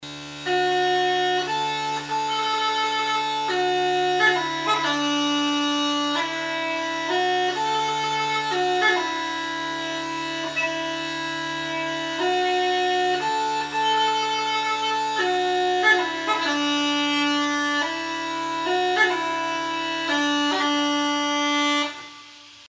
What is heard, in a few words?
set, uilleann; lynch